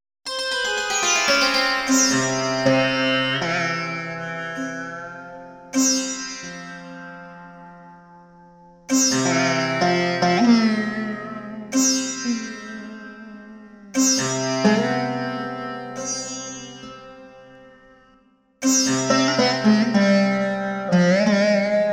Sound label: surbahar bhairav